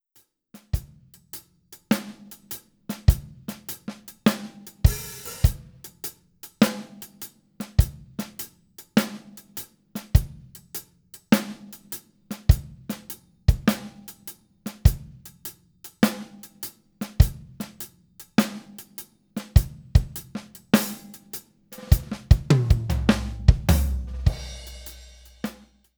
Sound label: half time shuffle